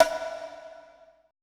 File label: CONGA 4 L; BPC DRUMS